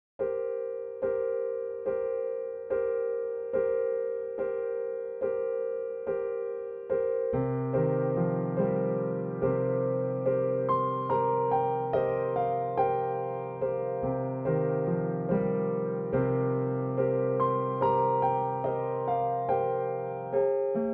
24EDO Solo Piano Miniature

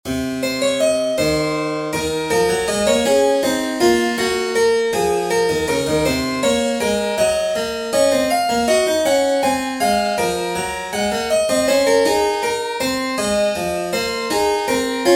Diasem Locrian Example 26edo

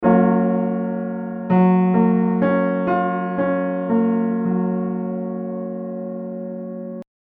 Supmaj7

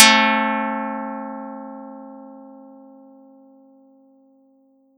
pluck 625 512; Audacity

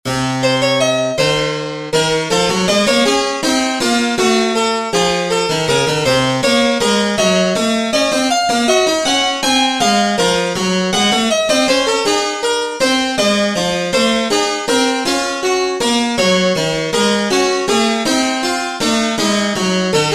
Diasem Locrian Example 14edo

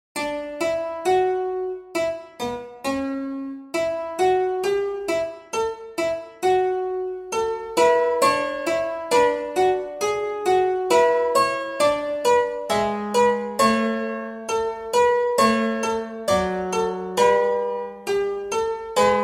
Fugue; Sixix

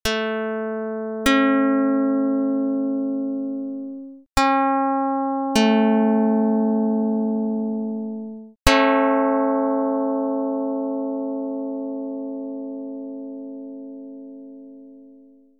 pluck; dr220

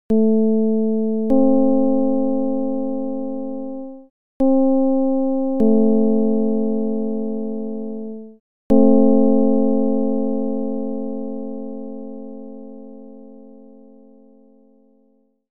csound foscil 220hz

